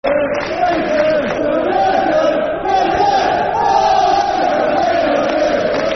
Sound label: Football Chant, Soccer Songs; We Love You Hereford; Hereford United Hufc